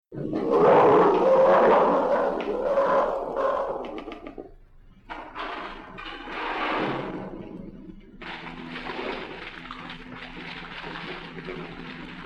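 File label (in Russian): звуки пекари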